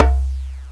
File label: Arabongo; Indiana Jones Demo; iMuse